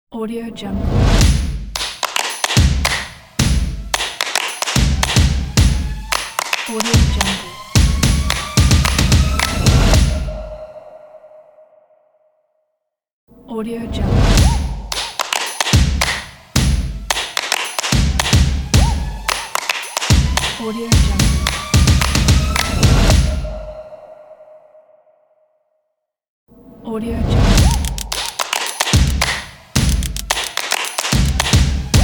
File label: stomp logo